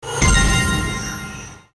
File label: nice win; All Ways Joker